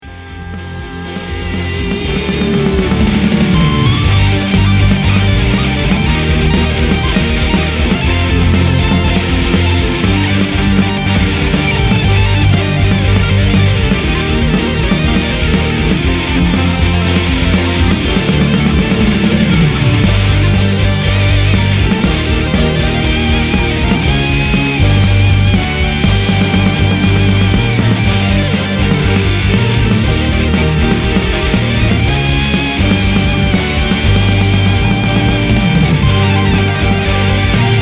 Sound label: Studio Session Improvistation